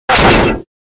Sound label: hit